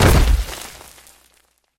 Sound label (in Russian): korotkij chyotkij bulk vodi